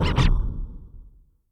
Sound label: Shazam